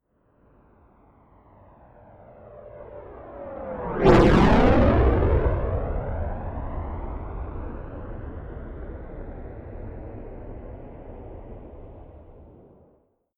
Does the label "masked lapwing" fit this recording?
No